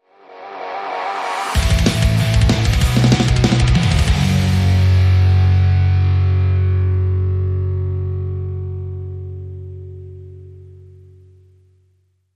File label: Metal Speedway